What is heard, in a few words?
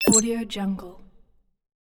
futuristic device